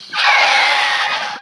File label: Death; Nesting Roc